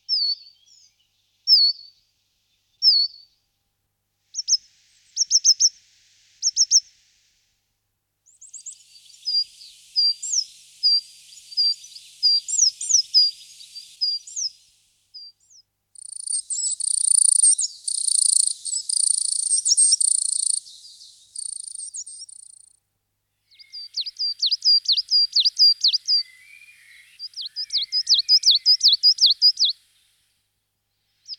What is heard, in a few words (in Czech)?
Sykora uhelnicek Parus ater